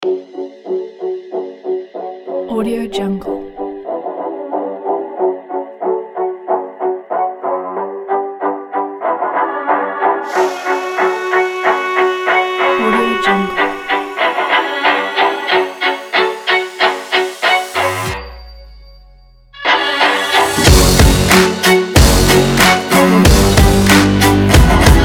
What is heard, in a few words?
dirty beat trailer epic sport